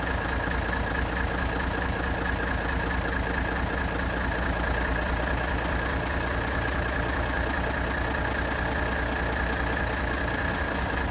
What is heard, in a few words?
tiger diesel engine rpm; War Thunder